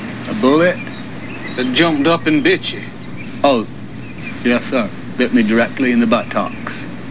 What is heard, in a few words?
bullet